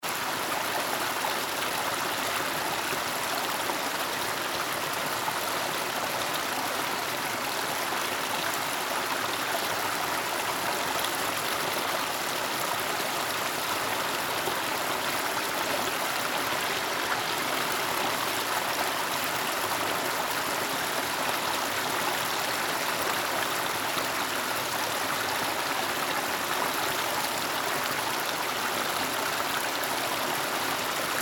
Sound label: Tsuzura Tanada; Ukiha